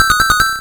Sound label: Egg; Super Mario Bros. Remastered; Yoshi Egg; SoundFix resource pack